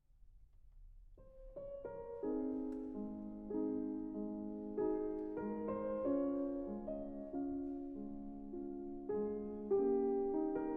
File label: Piano Trio in E-Flat Major, Adagio Cantabile